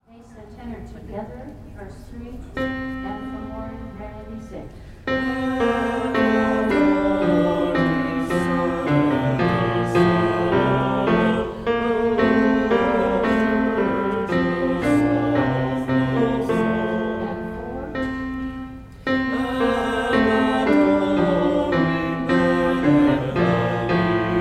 bass and tenor repeats, Holy God We Praise Thy Name; 615 Holy God We Praise Thy Name bass and tenor repeats vs 3 and 4; Gather Hymnal